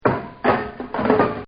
Kick A Can, Clanks; Sound Effects:Objects

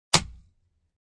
Stick Crack, Breaking a stick; Cracking

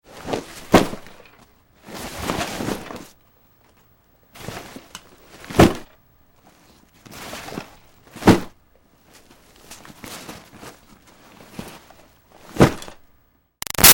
Foley Duffle Bag Sack Moves Drops; Objects

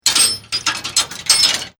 Chain Metal Clanging

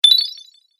Collect Magic Prize, video game sound effects; Twistedtracks

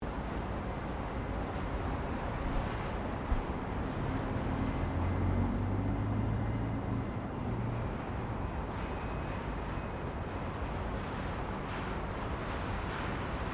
Wind And Resonance In Apartment Building; Weather

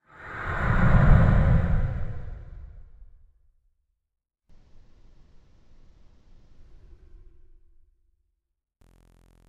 Dragon In The Dungeon, monster sound effects; fantasy; role playing games, magic videogames; Andrew Fleming

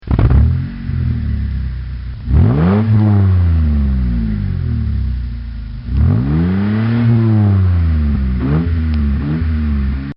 Dodge Stealth Start And Rev Engine

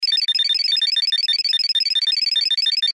Sci Fi Beeps Computer Readout Display Loop; Tech; Blastwave

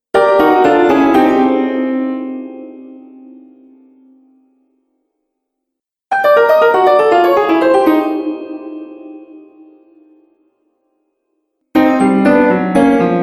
Fantasy Failure Sound Collection, cartoon; Cartoon Sound Design Sound Effects

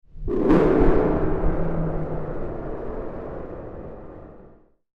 Hi-Tech Impact; Miscellaneous Impact Sound Effects; science fiction sound effect

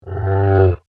Dinosaur Bleat, Creature; Animal; Dinosaurs (Sound Effects:Animals)

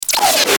Glitch Fuzzy Descend; Glitch Sound Effects; Production Element Imaging Element Accent Transition

